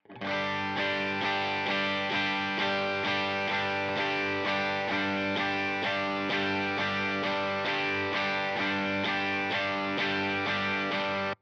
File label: Single Chord